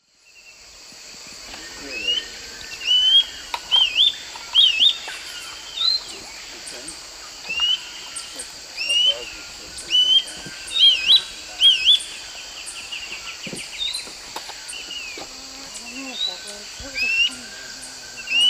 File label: Tolmomyias poliocephalus, song; S. America; Eastern Lowlands